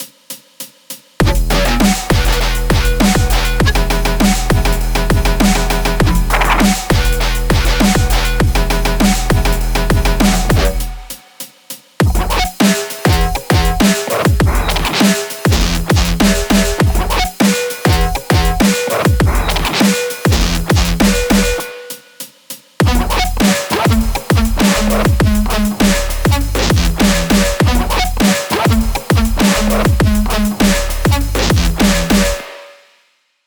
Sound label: guitar noises; music production